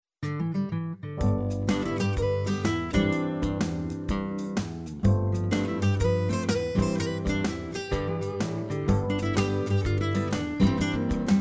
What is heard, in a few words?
country